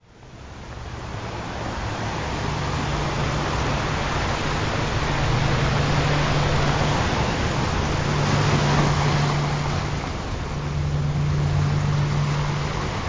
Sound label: Rife Frequencies, Ocean Sounds; Lymphatic System Healing; Thymus; Spleen